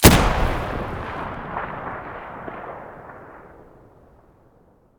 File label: muzzle M700 mono, weapons